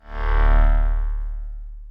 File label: Flashback Warp